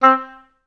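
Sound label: oboes staccato C3, orchestra; Best Service; Peter Siedlaczek's Advanced Orchestra; OBS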